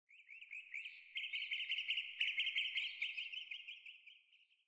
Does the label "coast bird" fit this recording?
Yes